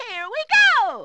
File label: grenade; toad